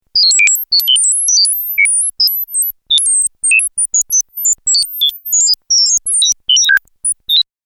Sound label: Computer Calculating Operation, Sounds Like A Computer That Makes Calculations; Computers; Crafted Signals